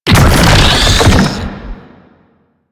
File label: Slinky Dead Zone Charge; Warframe; Man In The Wall